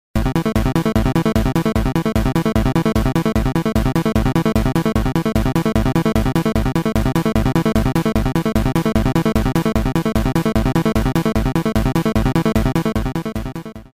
Tension; Battle Kid Fortress of Peril; nes

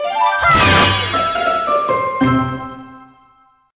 Crunchy Finish

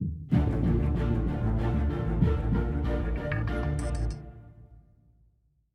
190bpm; Legends of Pegasus; windows gamerip 2012

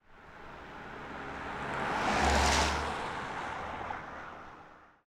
Car Passing

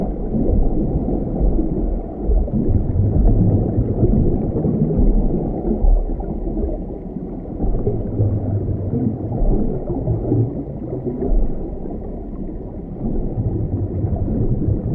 Water

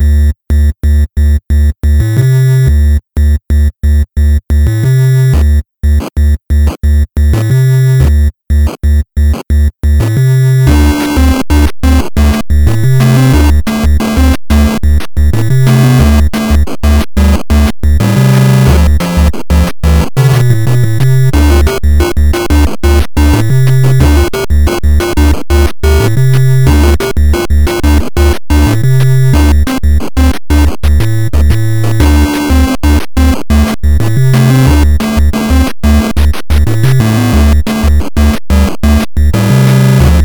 8 bit Music; Selma and the Wisp

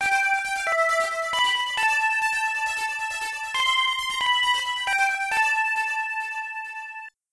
Startup; Neo Geo

